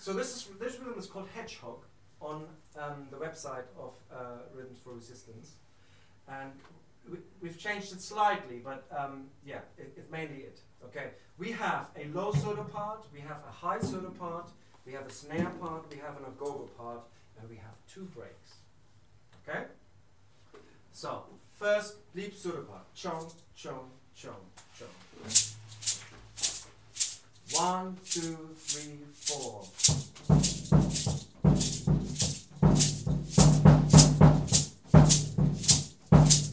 samba Intro; Hedgehog

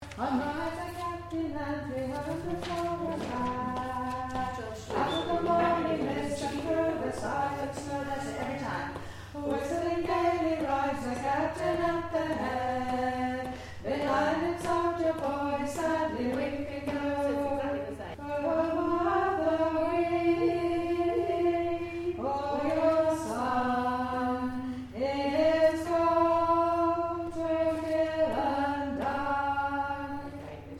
Alto

